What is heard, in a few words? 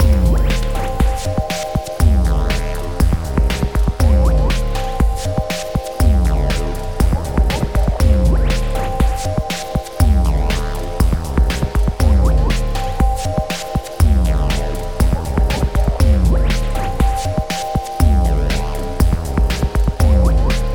loop